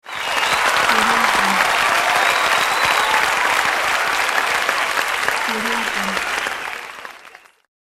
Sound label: applause